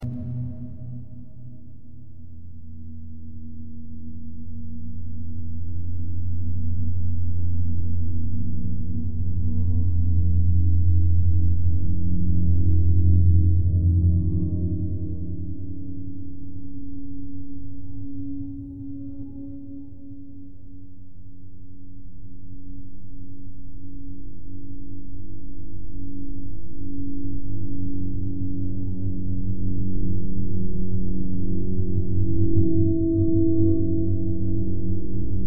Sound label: voidborne ambiance